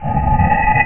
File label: BEAM OUT